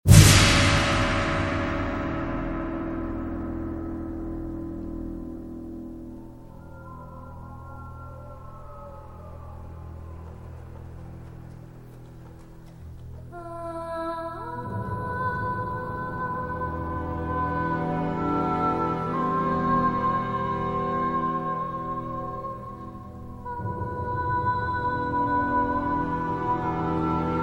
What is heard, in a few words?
scream